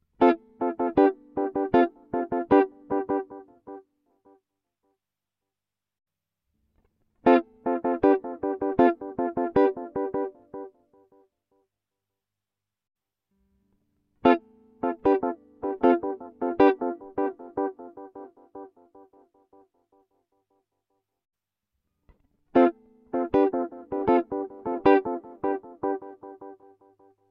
Delay Test

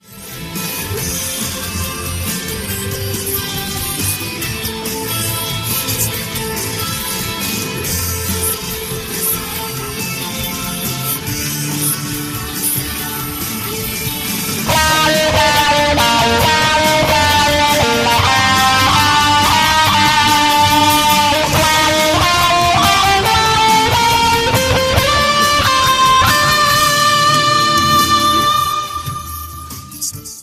solo

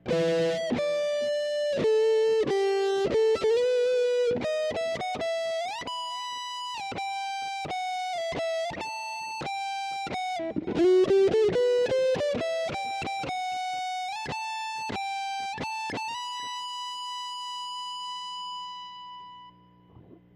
jam gt solo

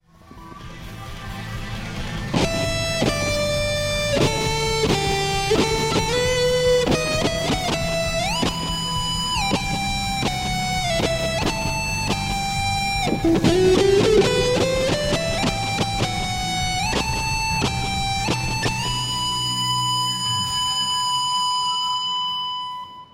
jam back sound on

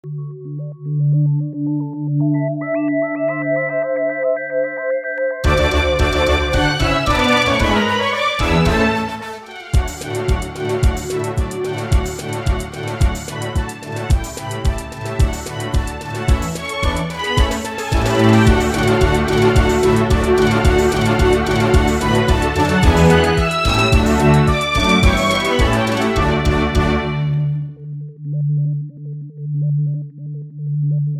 TR-606 mix

